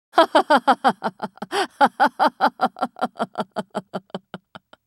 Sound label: laugh; Irelia